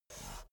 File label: chess piece move